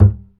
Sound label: acoustic bass fx; Spectrasonics; Bass Legends